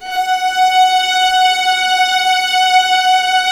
F#3, Ultimate String Collection, VLAS EXP; LEG

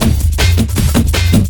LOOP L, Drum & Bass 160 BPM

